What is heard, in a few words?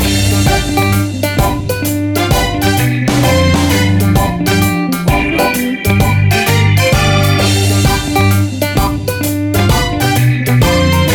Cool Reggae Feeling